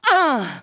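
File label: female hit; WarRock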